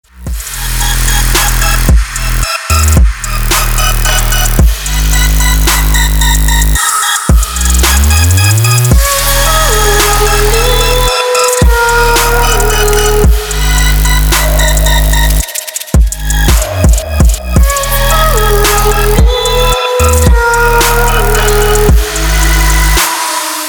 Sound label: bass boosted